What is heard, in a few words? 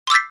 piano; disney; mashup